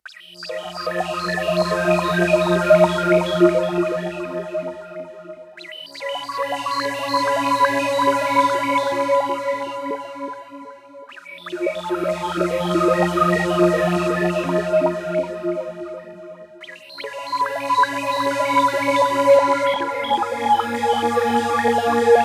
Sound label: Melodics; neuro; Optiv & BTK